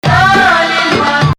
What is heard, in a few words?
Nahawand, maqam